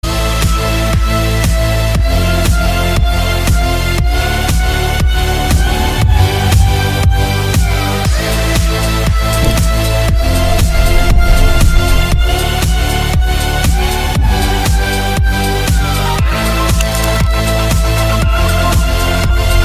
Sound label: beautiful trance, ringtone; tomorrowland